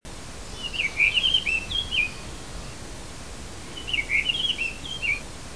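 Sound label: blue rock thrush voice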